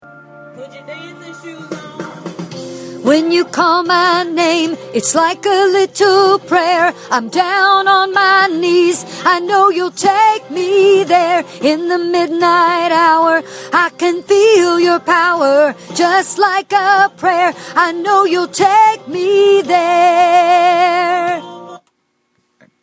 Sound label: Chorus Lead